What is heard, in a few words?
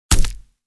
Fire Weapons Sound Effects bullet hit body